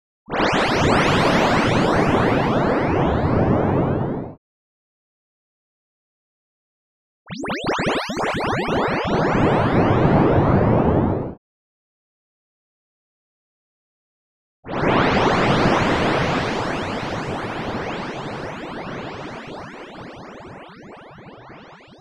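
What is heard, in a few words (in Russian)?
аниме энергетическая волна собирает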